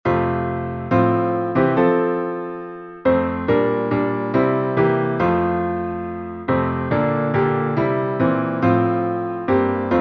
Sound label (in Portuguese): salmo playback